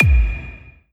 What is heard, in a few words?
New Stack